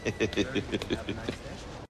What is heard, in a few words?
hehehehe; Sound FX; Crowdsourced Sample Pack